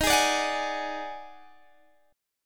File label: D sharp Minor Major 7th Double Flat 5th D sharp, down, Guitar, Standard; strummed; chord